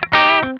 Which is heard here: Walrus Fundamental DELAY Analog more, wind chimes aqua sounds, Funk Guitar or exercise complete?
Funk Guitar